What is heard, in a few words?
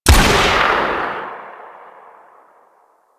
Sniper Rifle; Female; Wraith